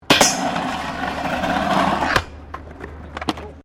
Skateboard Grind; Skateboard sounds; Sports; Skateboarding

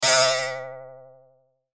Hit Robotic Warble; Metallic; Hit Thud Strings Production Element Imaging Element Accent Transition; Blastwave

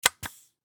Industry Brad Nailer Electric Shoot Single; Power Tool Sound Effects; SFX Bible 6

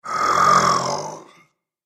Zombie - Sleepy; horror; Zomby Sound Effects

